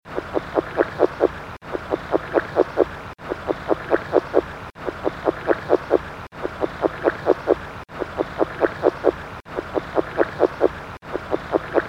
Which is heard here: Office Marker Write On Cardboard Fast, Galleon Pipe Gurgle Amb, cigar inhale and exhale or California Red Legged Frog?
California Red Legged Frog